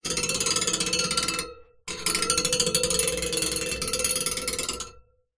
foley metal bolt metal run scrape along small bars resonate